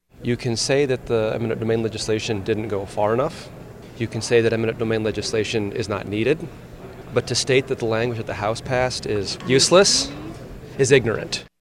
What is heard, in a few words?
Pipe